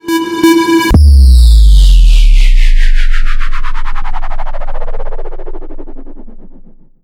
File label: sms ring; jpovescheniya ob sms